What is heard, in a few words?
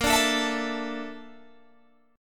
A sharp Major 7th Flat 5th, Guitar Open G